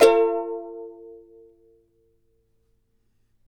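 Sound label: charango B minor up; Spectrasonics; Hans Zimmer Guitars VOL-2; CHARANGO CHD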